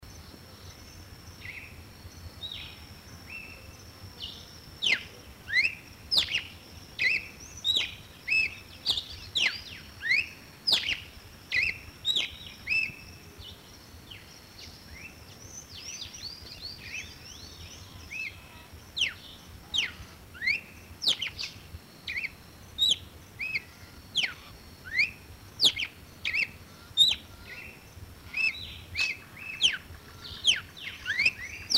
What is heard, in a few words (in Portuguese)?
cardeal do nordeste, canto